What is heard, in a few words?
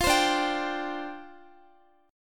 D Minor 11th D, Guitar; Dm11 strummed